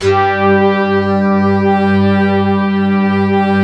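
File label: synthesizer, digital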